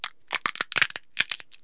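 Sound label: nutcracker; KABOOM! - Wild and Wacky Sound Software; house; foods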